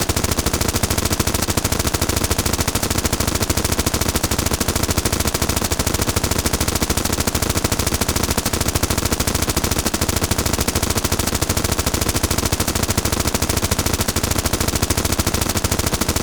Machine Gun

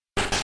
Entering Door; pokemon reborn e19 2022

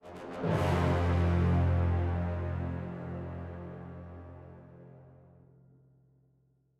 End 135bpm

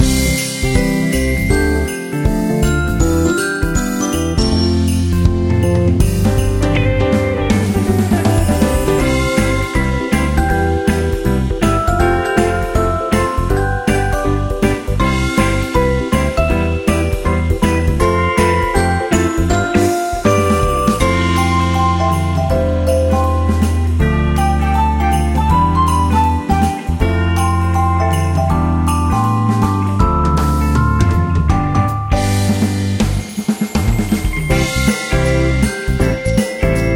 Christmas Battle Music; Naruto Ultimate Storm; android; StudioDOMA Kihan

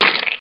landing

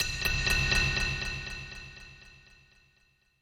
BGM stinger, BGM Player Dying Out; Babylon's Fall

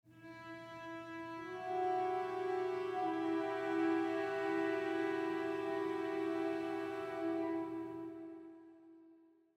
Perc Build With Strings Mood; gamerip; the last of us

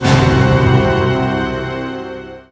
game lose

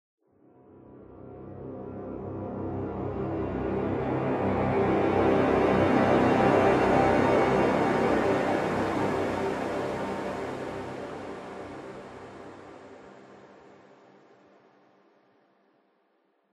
Stinger; Alice VR

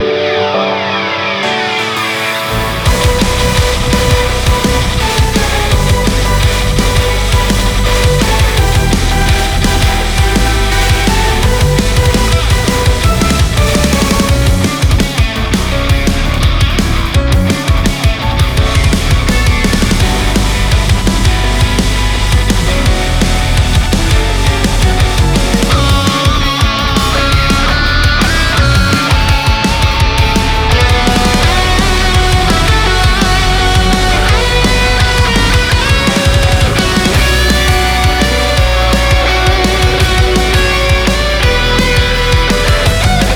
BGM Battle, gamerip; dusk diver 2; ps4 ps5 switch windows

